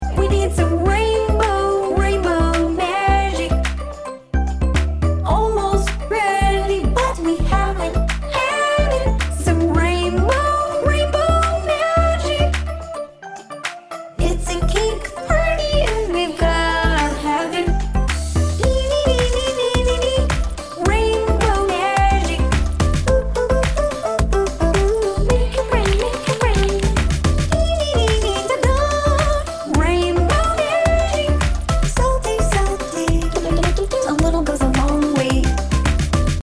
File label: ringtone android; Rainbow Magic